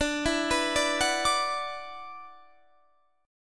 Gb 7th Suspended 4th Sharp 5th D

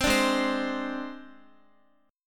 Ab Major Flat 5th Ab down Guitar; AbMb5 strummed; JGuitar

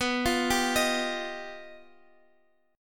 G Augmented 7th

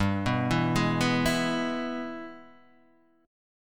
G sharp Minor Major 7th Sharp 5th

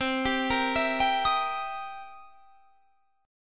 C 7th Sharp 9th C; C7#9 arpeggiated; 20,22,20,21,20,23

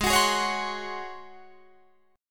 Ab 11th Ab Guitar Drop D; Ab11 strummed

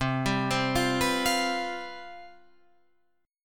C Major 7th Suspended 4th C down Guitar Open F; CM7sus4 arpeggiated

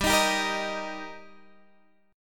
Eb Major G

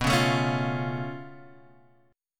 C sharp Augmented 7th B

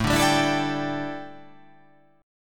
A 7th Suspended 4th, A, down

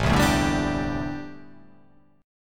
A Minor 11th A, Chord

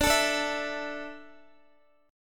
D Suspended 2nd D down Guitar, chord; Dsus2; strummed

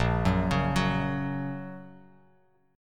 Bb Minor Sharp 5th, Bass Standard, Bbm#5 chord; arpeggiated; JGuitar

